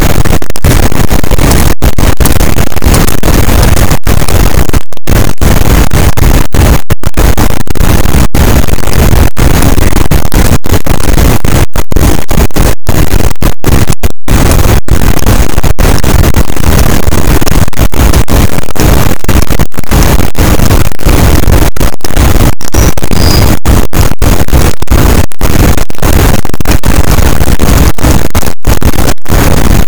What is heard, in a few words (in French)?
cachalot positif, boat; Physeter Macrocephalus; SNR -6dB